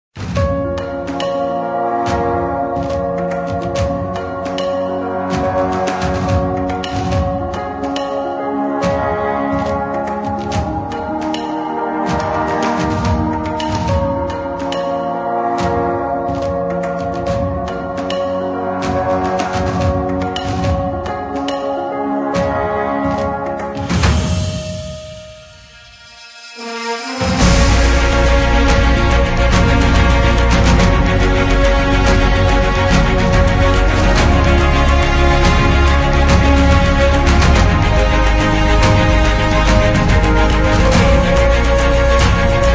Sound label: Inspiring Trailer